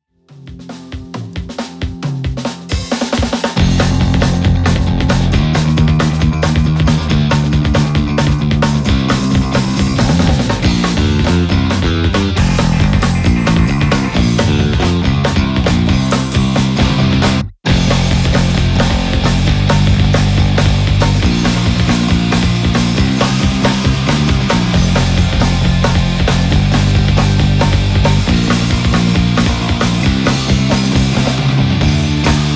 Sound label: Pick Alusonic Custom Shop JSP DX 4